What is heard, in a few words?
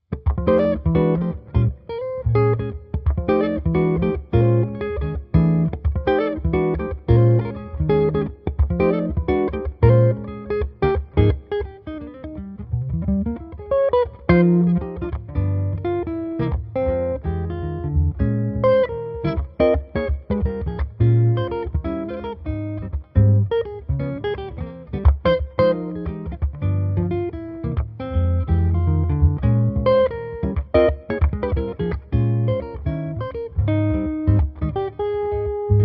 guitar cover